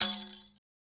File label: Percussion, Drum Tracks; Hip Hop; big fish audio; Hip Hop Exotica; Apple Loops